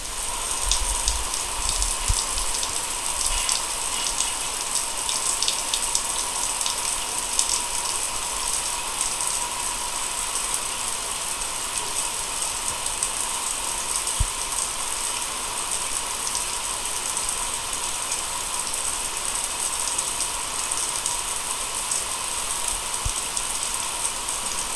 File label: Shower In The Shower